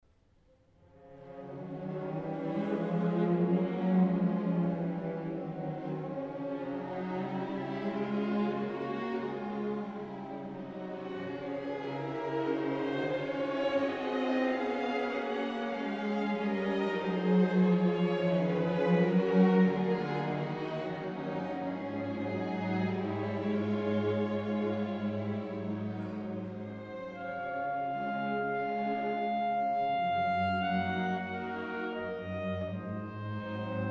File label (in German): Adagio Klarinette; Wagner